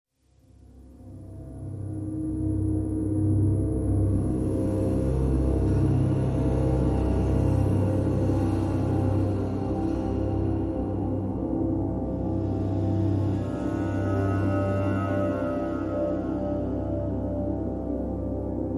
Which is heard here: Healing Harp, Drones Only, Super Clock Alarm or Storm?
Drones Only